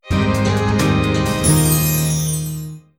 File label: logo sunrise waltz